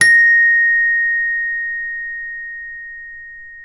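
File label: CELESTA C5, Synclavier Percussion; ILIO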